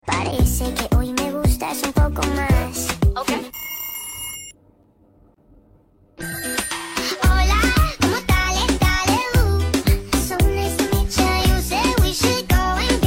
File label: Mobile Ringtone